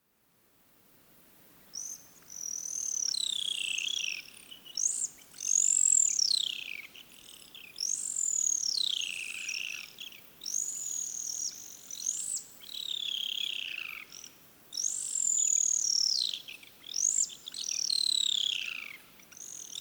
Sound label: Sooty Owls